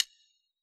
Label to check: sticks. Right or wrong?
Right